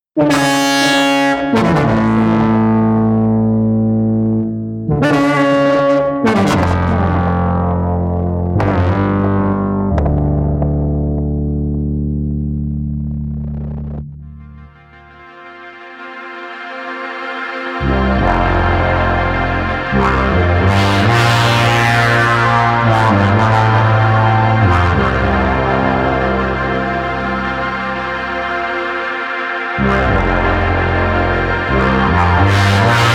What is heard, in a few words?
rootbass leads